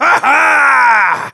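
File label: laugh short, German; Demoman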